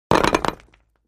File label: Concrete Impact, concrete & brick